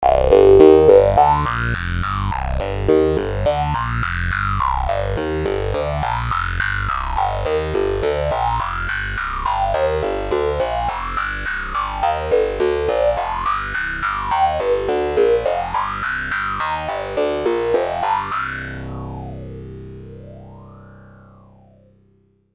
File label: ms20 clav; opxpro